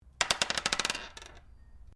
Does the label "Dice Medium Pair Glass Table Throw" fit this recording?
Yes